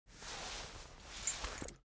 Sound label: cloth key ring small trousers pocket fetch; foley; smartsoundfx